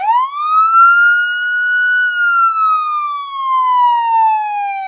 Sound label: Siren Long